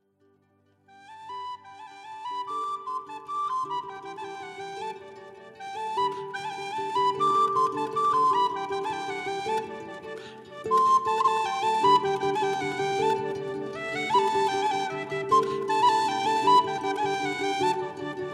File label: Trio Galician Caroll